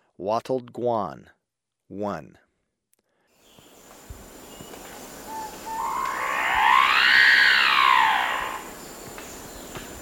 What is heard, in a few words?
Wattled Guan, Birds of Ecuador; S. America